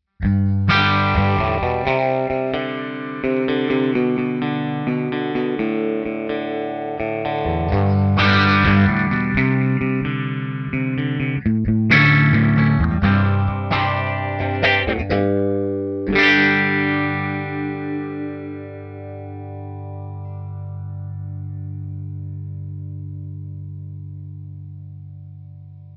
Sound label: Rock TwinR Phaser, guitar; Rock – TwinR – P90 Phaser; ReTube Custom Shop